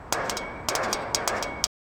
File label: crackling torchlight and; metallic clinks